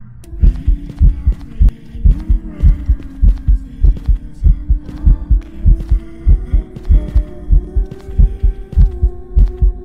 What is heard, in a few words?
singing ghost; phasmophobia